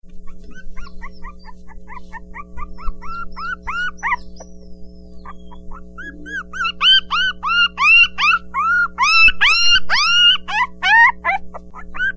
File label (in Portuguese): cachorrinho; animals